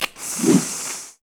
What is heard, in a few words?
bomb spawn; Animation Throwdown